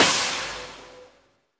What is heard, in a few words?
Drums; Distored Reality